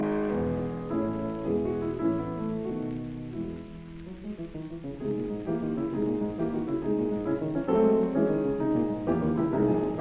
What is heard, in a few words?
Scherzo in C sharp minor; Op 39; Claudio Arrau